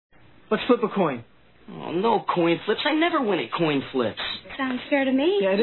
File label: coin